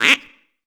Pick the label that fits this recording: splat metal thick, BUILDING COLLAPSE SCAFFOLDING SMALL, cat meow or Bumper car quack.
Bumper car quack